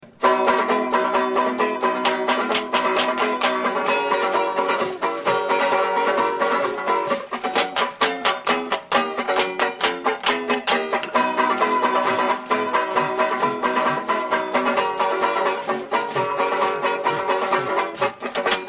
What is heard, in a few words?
froggy music